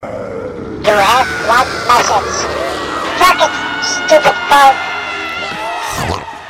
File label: horror